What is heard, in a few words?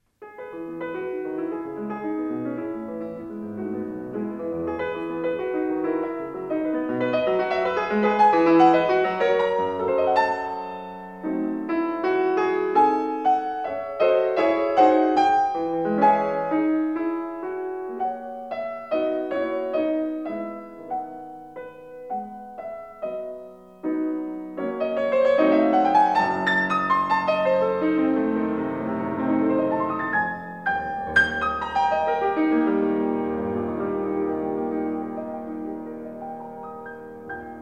Piano Sonata No. 30 in E major; Wilhelm Kempff; Beethoven; Piano Sonatas